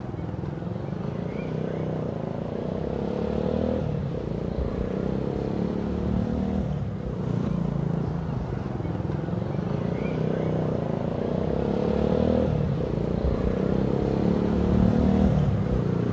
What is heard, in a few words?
Far motorcycle; anemoi